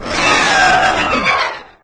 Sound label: die; doom3; archvile